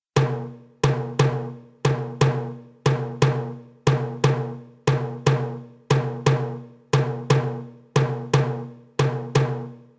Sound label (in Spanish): tambor